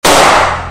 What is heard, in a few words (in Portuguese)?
tiro; de toddynho